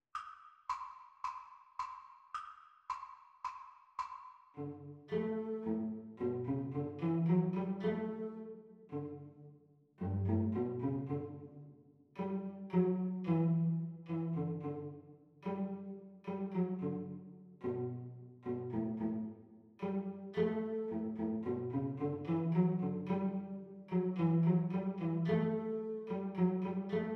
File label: Cello Duet Playalong; Exam Speed